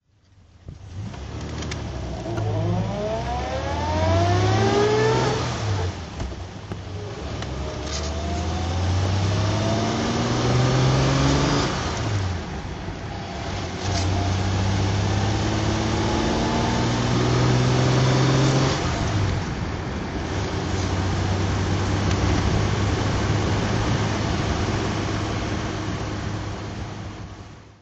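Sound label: jaguar mkV drive, Engine sound driving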